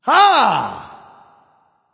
"ah", discover; smite; voicepack; ullr

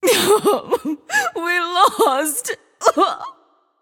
boo hoo; daji

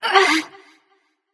grunt lift light; smite; voicepack; hel

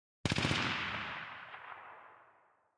landing gun burst; smite; Ares

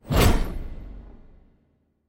giant fire swing; smite; vamana

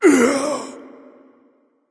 grunt hurt; smite; voicepack; hun batz

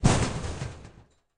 defeat; Smite; god Khepri

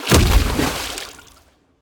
ice hit burst; smite; god merlin

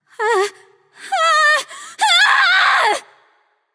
fear, voicepack; smite; pele

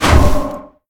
air disc; smite; kukulkan

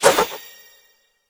fire; smite; god raijin

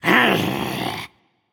growls

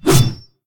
swing; Smite; Tiamat

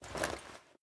Armor; smite; raijin

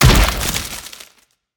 Hit Land; smite; kumbhakarna

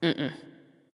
nv disagree; smite; awilix

